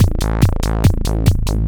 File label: Disco Bass Steady on A, Synth Bass Loops; Basses; Sonic Foundry (Sony Creative Software); Groove Elements